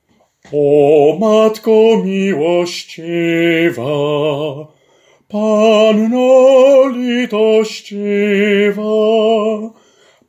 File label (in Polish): SOPRAN; O Matko